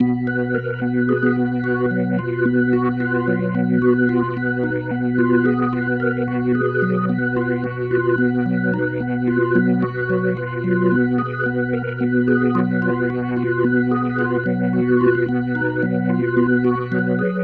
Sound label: Psychedelic, Organ, Loops; Sonic Foundry (Sony Creative Software); Joe Vitale Organ Donor